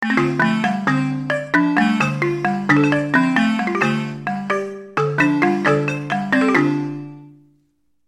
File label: Balafon